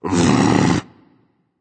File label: tongue berserk; cuchulainn